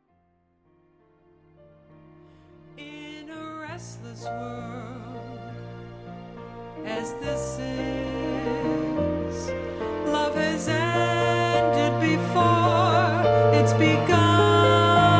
vocal, jazz

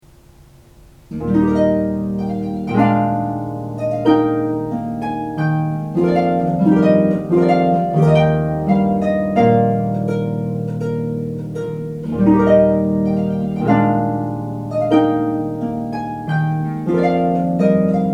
Trumpet Voluntary, harp; Clarke; Liesl Hagan

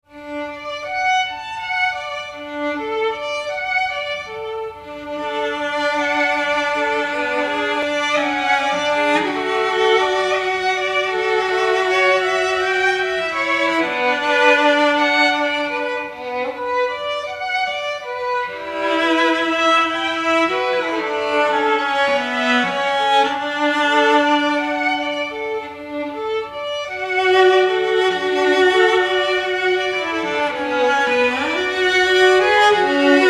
Duo, classical string

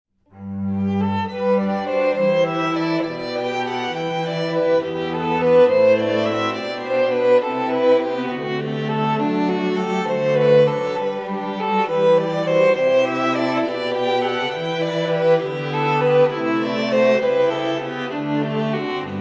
String Quartet; Jesu Joy Of Mans Desiring; Bach

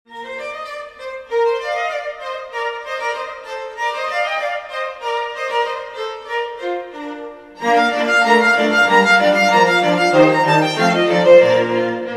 String Quartet; Arrival Of The Queen of Sheba; Handel

